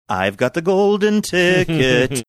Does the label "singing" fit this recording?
Yes